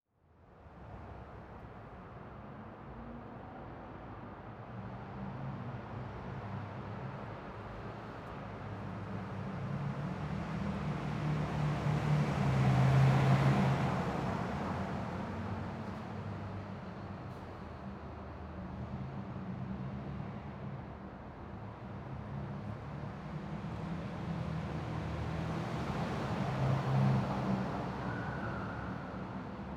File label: Ambience Parking Garage; AdobeCC